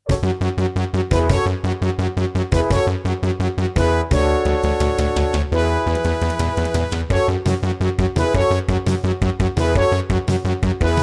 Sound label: Intro, NEC XR385 (DB60XG Clone) MT32 Mode; 4D Sports Boxing